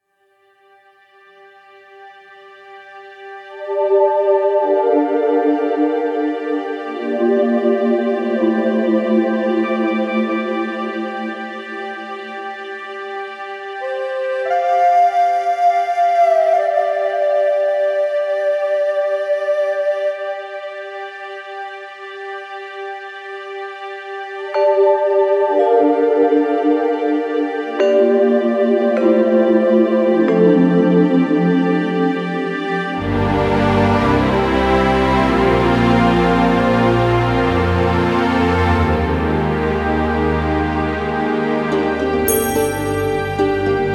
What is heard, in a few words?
Final Music; Yeesha